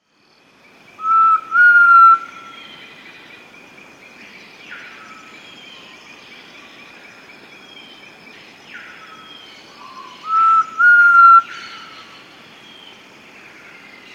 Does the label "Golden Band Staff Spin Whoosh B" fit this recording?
No